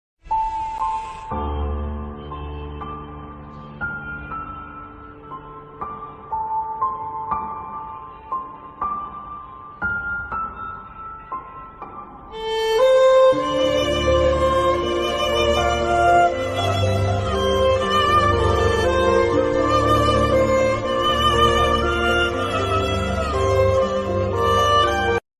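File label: Sad background music Ringtone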